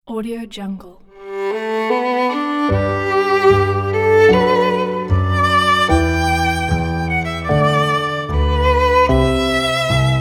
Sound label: sentimental violins piano